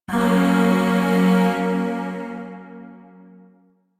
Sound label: Shawzin Hirajoshi Chord J; Music; Chords; Warframe; Zariman; Void